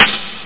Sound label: cymbal highhat + rimshot; OctaMED SoundStudio V1; drumkit; cymbals